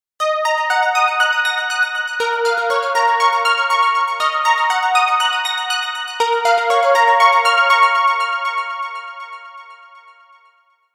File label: dreamy harp